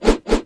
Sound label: Shark attack; Eft